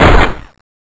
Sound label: ruger fire, weapons